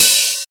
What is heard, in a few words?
open high hat sample b key, Royality free open hi hat tuned to the B note.